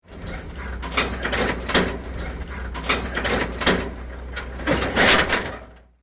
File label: Vehicle Wrecker; Zomboid; tsarslib